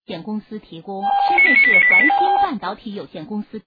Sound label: Ring Ring Ring